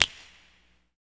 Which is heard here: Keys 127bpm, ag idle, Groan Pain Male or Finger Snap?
Finger Snap